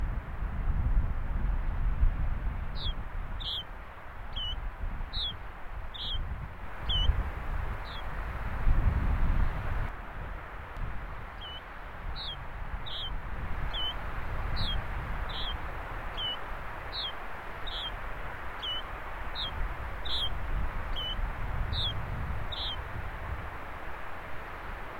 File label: yellow bridled finch